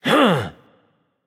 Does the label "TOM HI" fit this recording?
No